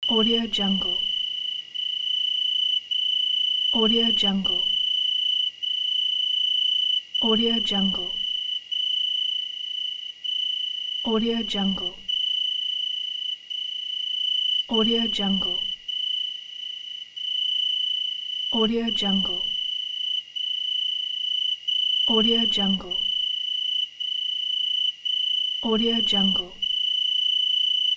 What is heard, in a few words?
summer night crickets atmosphere